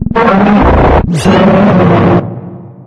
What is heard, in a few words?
dog angry; Source Engine; video game; npc